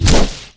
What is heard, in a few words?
Woosh